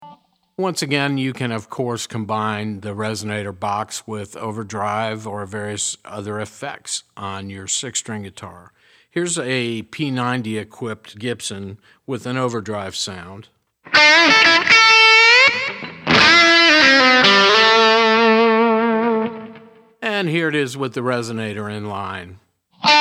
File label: resonator pedal, steel guitar